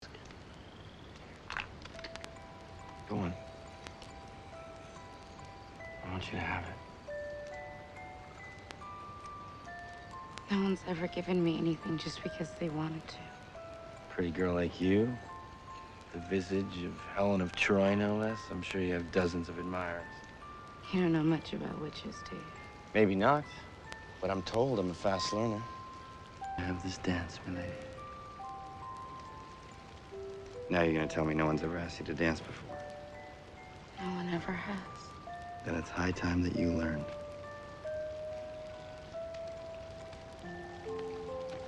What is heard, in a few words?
music box